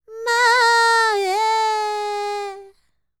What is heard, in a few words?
World Voices, INDIA; ILIO; Vocal Planet